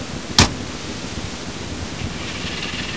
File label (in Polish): syrena